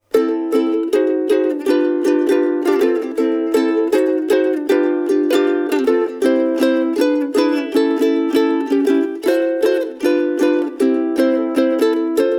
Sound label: Kala KA-15S solo